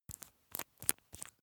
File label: makeup box screw cap open; haus-garten; badezimmer